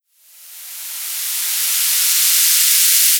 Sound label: Riser W Noise Rise